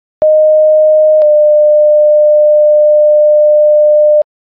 siren; clinton

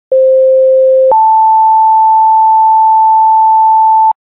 siren, tones; elk

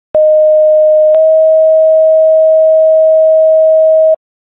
siren; clinton

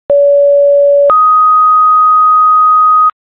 fire, tones

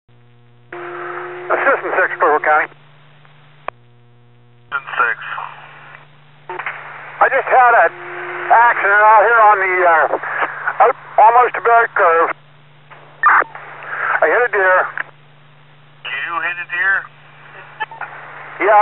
deer strike; clearfield

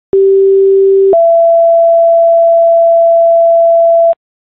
siren, tones

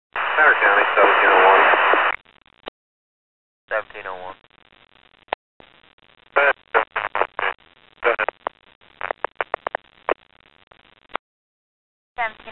interference

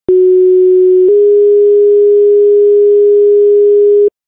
mystery tone

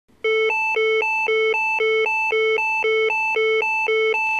lifepak 12 tone a

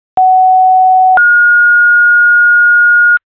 fire, tones

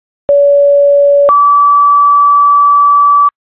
chief, tones

